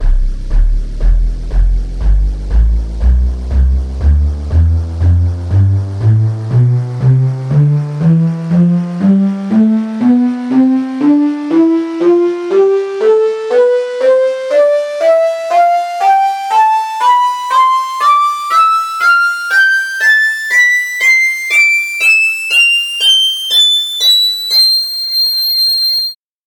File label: shakuhachi tonleiter